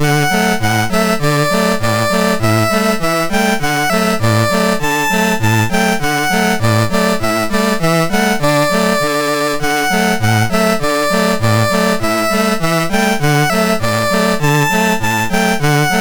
Go tell Auny Rhody the Old Grey Goose is Dead, MOS6581R3